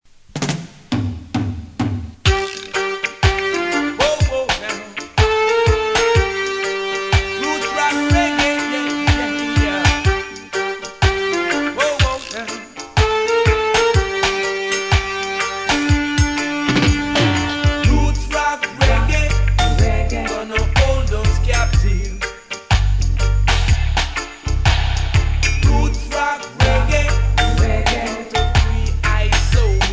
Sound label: roots rock reggae